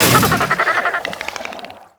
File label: Cage Break; angry birds rio; Pink Dolphin Cage Break